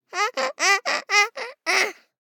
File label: BABIES TALK Boy 12 months Donkey Like; Baby Boom